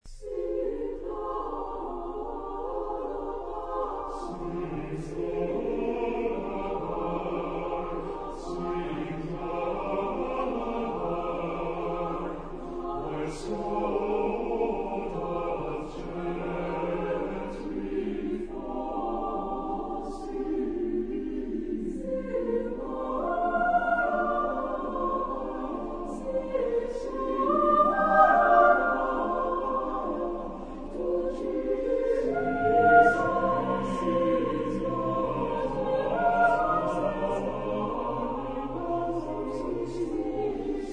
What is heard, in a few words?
Sing Lullaby, chorus; Howells